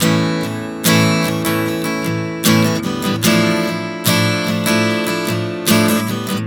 Prog 074 A-Dm, Acoustic Guitar, Chord Progressions 074 bpm; Sonic Foundry; Songwriter Acoustic Guitar Companion